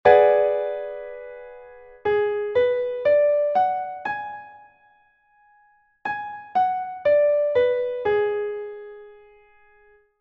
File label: subtonic seventh; Essentials of Music Theory: Elementary